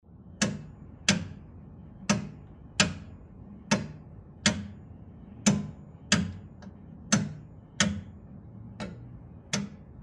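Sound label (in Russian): pendulum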